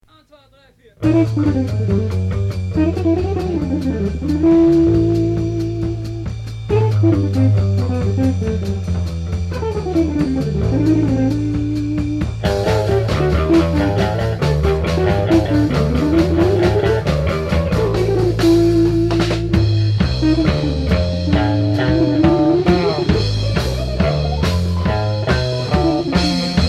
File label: Swing